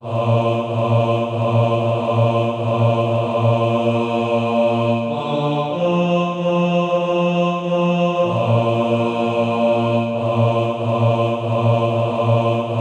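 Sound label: bass, Practise Music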